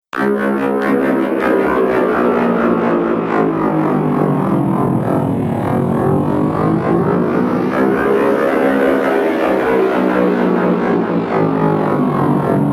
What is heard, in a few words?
Racer Pod Engine Flyby, Spacecraft Sound Effects